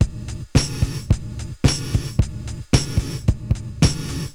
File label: 80s Gated Verb Korg MR-16 (110BPM), cyborg beats